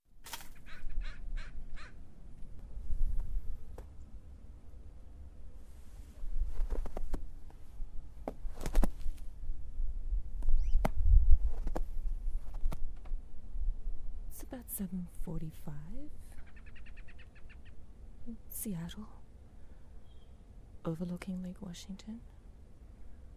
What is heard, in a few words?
Snow Seattle Prayer